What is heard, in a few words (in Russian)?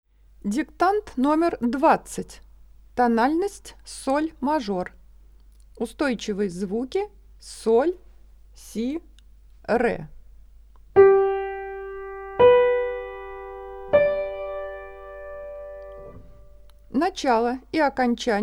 Соль-мажор